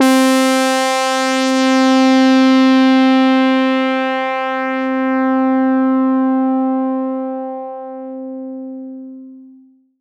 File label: lead C4, EMS VCS3; Polyend; Simulacrum Sounds; 1 Shots; Synths; Leads and Keys